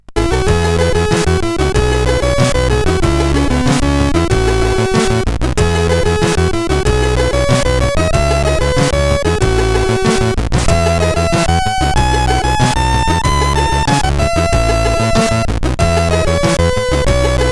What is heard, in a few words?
Loopz Musix, sid CSG8580R5